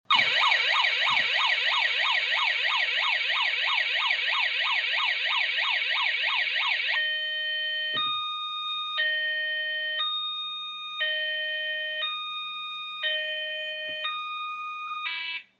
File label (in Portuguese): SIRENE 3 SONS